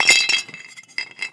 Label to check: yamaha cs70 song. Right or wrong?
Wrong